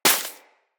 Bullet Impact Debris Snow; Weapons

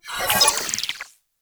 Cannon Hack Stop; Makvoss Cannon

